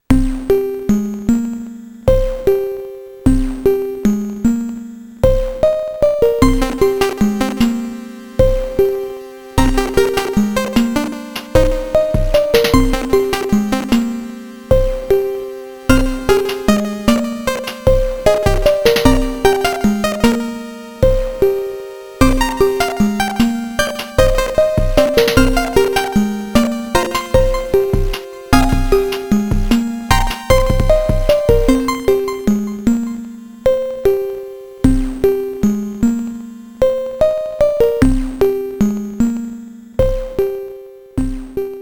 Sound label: PC Engine TurboGrafx 16 hardware